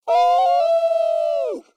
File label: before dash; fowl-play; boreass; enemies